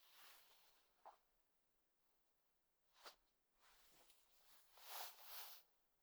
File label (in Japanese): ブラジャーを脱ぐ; 動作系